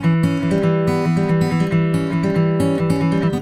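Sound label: Country Guitar, Verse 2 Section 1; Digidesign; Pro Tools Essential Loops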